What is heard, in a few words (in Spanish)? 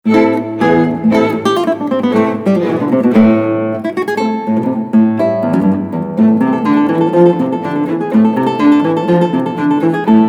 Sonata en La m (Guitarra y cuarteto)